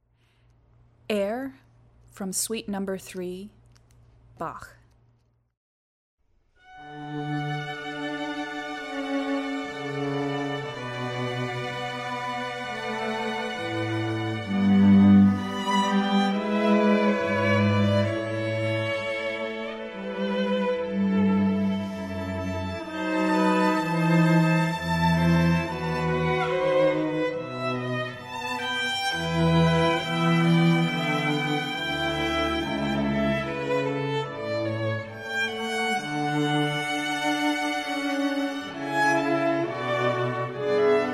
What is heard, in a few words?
Orchestral Suite in D; Pan String